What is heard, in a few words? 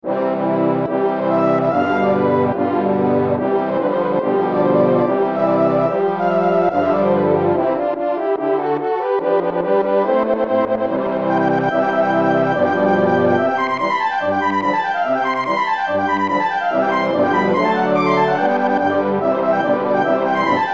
french horn; hirwa florent